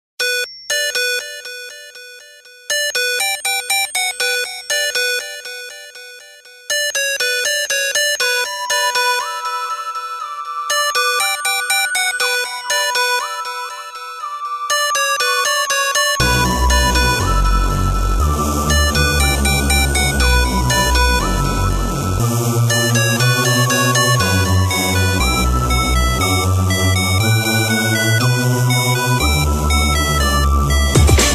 drum bass remix

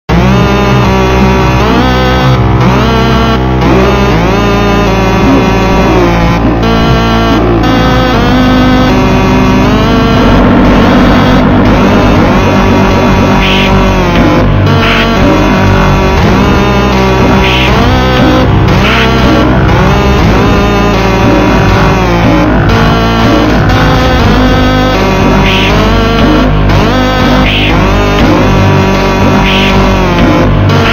ultra slowed reverb brazilian phonk

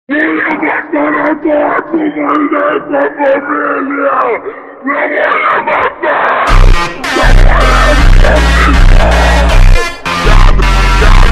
funk super slowed reverb

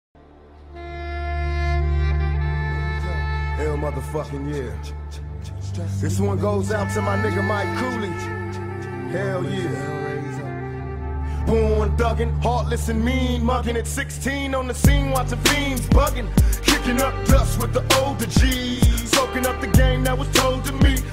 duduk remix

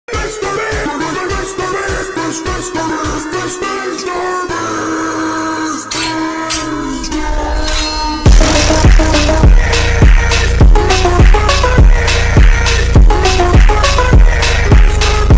phonk slowed; MrBeast; meme song; tiktok meme

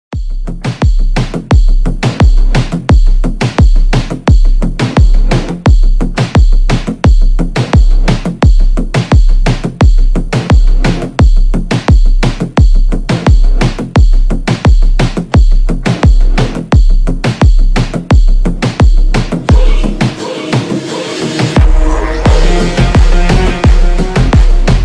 slowed n reverb remix

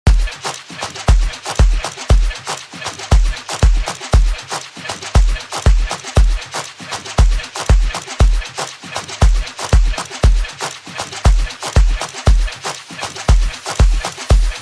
3 step bootleg

